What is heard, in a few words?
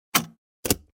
tape